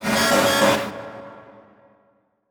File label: Production Element Title Transition Bed